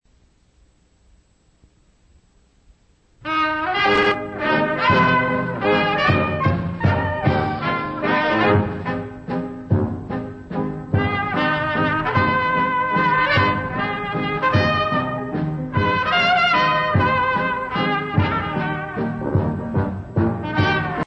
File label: CIRCLING PIGEONS WALTZ; Recorded Early 50's